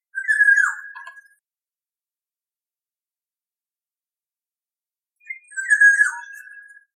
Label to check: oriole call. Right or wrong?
Right